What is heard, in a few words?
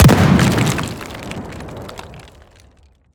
explosion debris rock; Bluezone Corporation